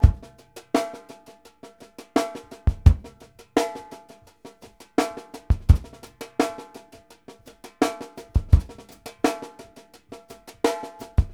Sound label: FUNK A, Drumworks; Sampleheads; New York City Drumworks VOL-1